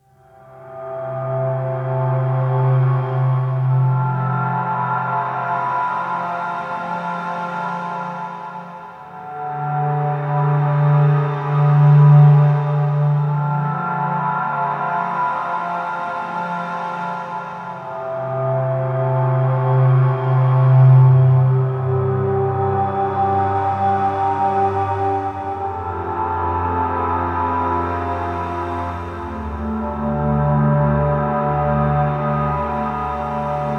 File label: creepy melody, pads